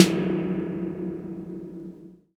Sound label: SNARE Tune, 808; Drum Kit; Polyend; Stazma & Concrete Collage; Spring808